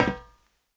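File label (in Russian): прямой глухой удар по металлическому тазу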